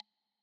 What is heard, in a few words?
checkbox uncheck; wayvr; wlx overlay s; wgui